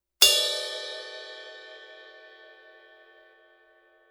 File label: classic ride 20 bell, cymbal; sabian